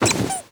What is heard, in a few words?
Pickup Plushie; Warframe; Cephalon Weave